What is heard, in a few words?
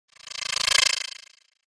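Electric Rattle Wash, multimedia element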